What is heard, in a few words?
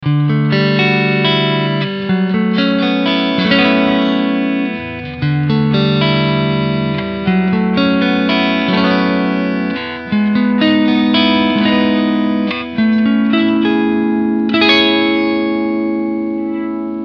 Clean Picking Front SC